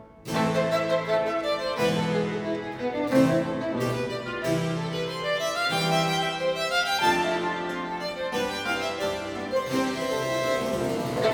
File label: Sonata for violin & continuo in A minor; Johann Heinrich Schmelzer